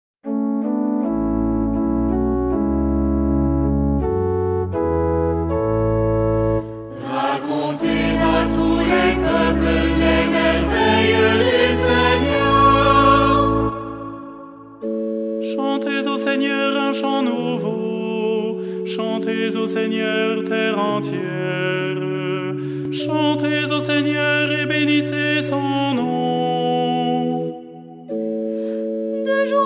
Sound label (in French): Ensemble vocal, Psaume 95; Hilarium; Racontez à tous les peuples les merveilles du Seigneur